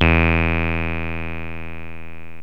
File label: bl saw, yamaha rm1x synth bass and lead